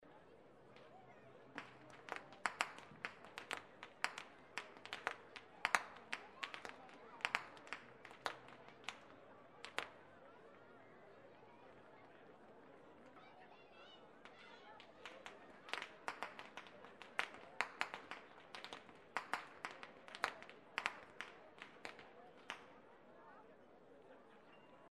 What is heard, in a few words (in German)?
schnalzen, jugend